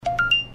Beep OK

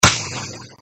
Hit Coin

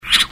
Wzhuh

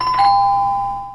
Bell Ring

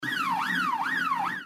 Police Syren

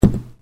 Knock

